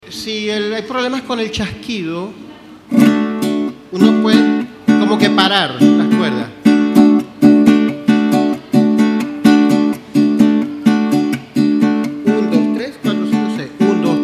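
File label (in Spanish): Ritmo Pasaje Sin chasquido, cuatro; Clinica Luis Natera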